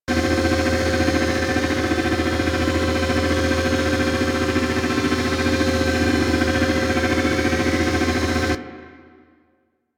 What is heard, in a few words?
Crp Arm Lift Loop; Ambience; Props; Loops; Warframe; Venus Open World; Venus Landscape